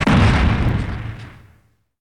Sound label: explosion; wesnoth